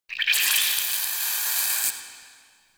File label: Interior Vain Squeeze, Infested, Ambience; Misc Indoor